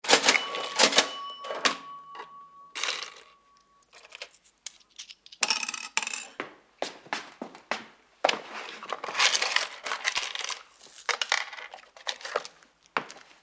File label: Cash Register 1920; Tom Hutchings